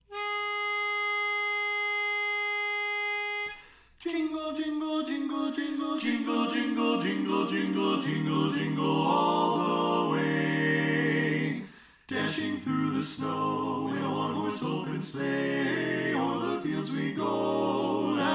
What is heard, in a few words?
Jingle Bells Tenor Left; Christmas show